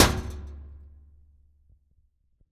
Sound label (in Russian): udar o metall